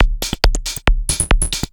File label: percussion loops 138; PS-45